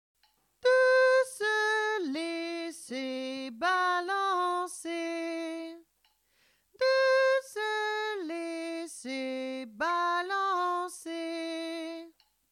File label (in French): canon jazz voix 4